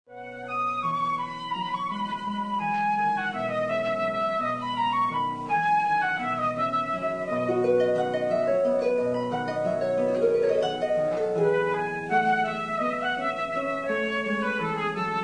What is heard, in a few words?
sonata harp flute; bach